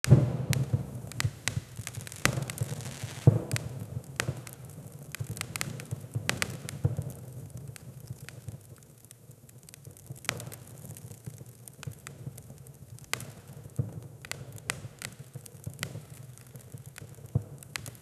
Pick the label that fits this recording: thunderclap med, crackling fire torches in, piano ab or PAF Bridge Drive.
crackling fire torches in